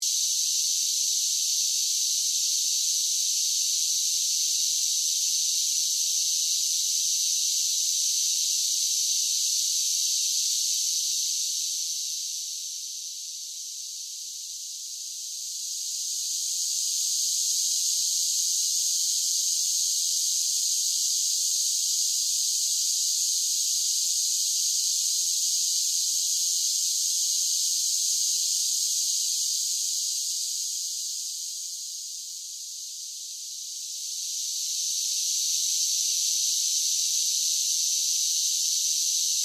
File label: Cicadas Sing, Animal, Cicadas, Sing, Song, Buzz, Insect, Bug; Large, Groups, Singing; BlastWave